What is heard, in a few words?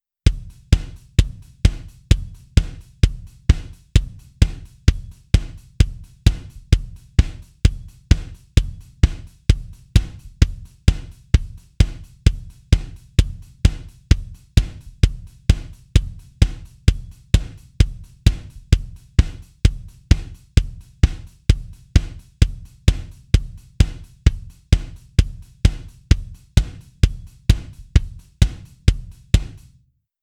Bass Drum